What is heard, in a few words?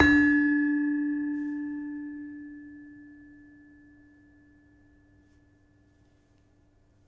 Demung, gamelan